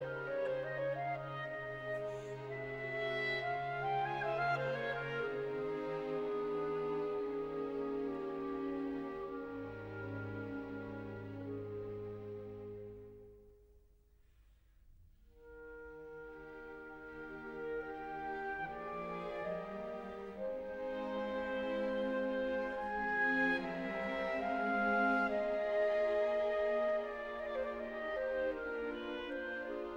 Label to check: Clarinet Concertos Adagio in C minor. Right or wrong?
Right